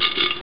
Klo; Amiga Soundtracker sample

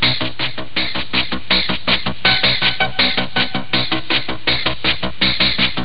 musik; Amiga Soundtracker sample

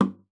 KLOPF, GUITAR FX; Xsample VOL-2

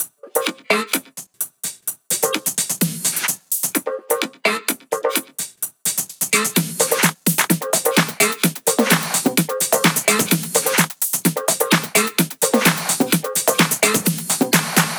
Drum Loop 128BPM FULL ohne BD

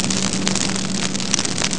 low feuer; Amiga Soundtracker Sample Packs

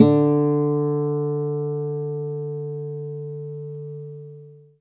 KONZERTGIT, Alpine Volksmusik

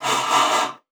Luft; Xsample VOL-3; STACC+FX

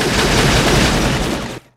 SCHUSS; Best Service; Advanced Mega Effects

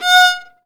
GEIGE, Alpine Volksmusik

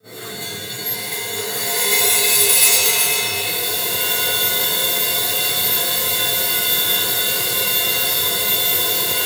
SCHNARR; Xsample VOL-9

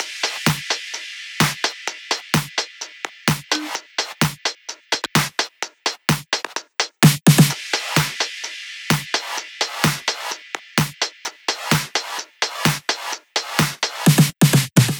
Drum Loop 128BPM FULL ohne BD

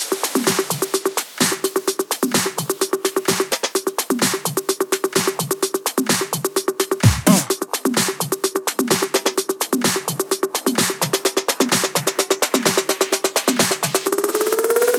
Drum Loop 128BPM FULL ohne BD

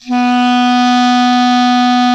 KLARINET C#2, CLARINET

